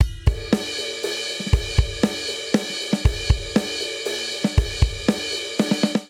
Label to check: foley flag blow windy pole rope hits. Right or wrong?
Wrong